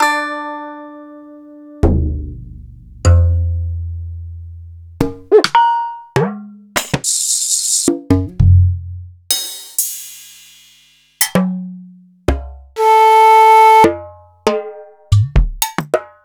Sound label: EMU Planet Earth